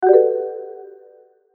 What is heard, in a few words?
receive message tone